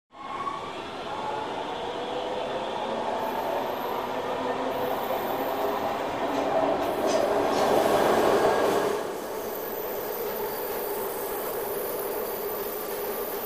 Subway Train Accel

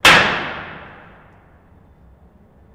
Jail Door Hard Hit; jail & prison doors

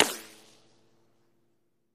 Bullet Ricochet Sin

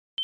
Heart Rate Beep High, beeps

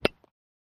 Aluminum Bat Knockin, baseball; baseball fx & games

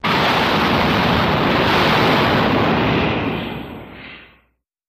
Metal Crash; crashes

